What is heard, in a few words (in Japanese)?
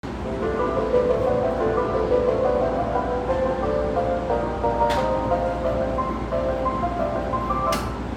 京浜東北線港南台北, train sounds